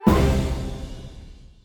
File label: Combo Win Hit; Duel